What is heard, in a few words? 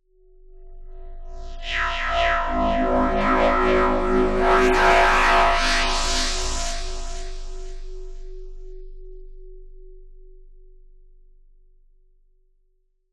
sweep dark delayed filter mod metallic fm; zapsplat